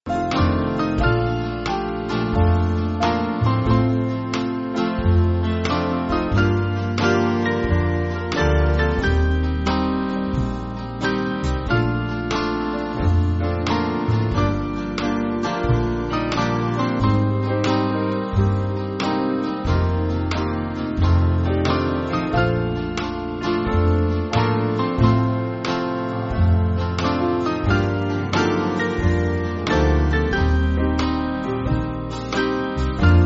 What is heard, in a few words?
Band